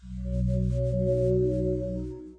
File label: STARTUP; Organic Art - The Art of William Latham